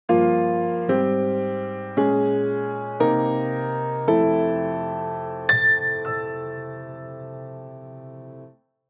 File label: upright piano; xln addictive keys